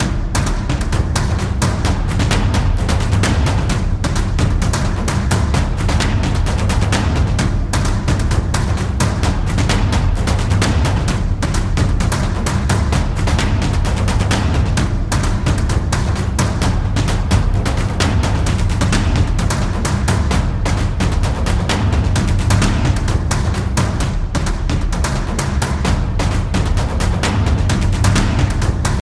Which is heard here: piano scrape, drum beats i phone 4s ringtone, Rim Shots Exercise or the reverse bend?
drum beats i phone 4s ringtone